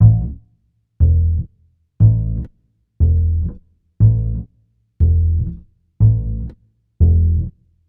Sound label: Upright Bass, Ukraine Gypsy; Sonic Foundry; Continental Drift Aftershocks